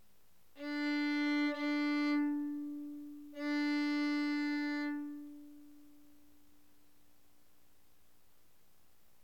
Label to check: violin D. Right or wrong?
Right